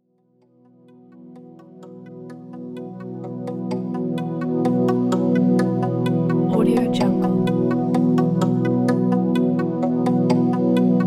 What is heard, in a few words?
quiz countdown